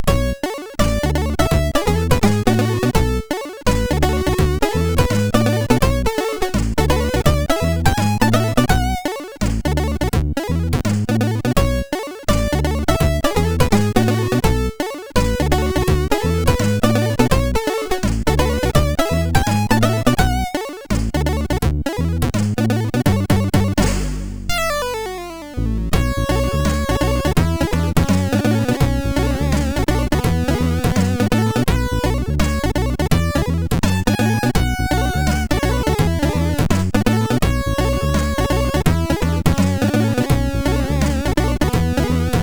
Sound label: Get Funky; MOS6581R4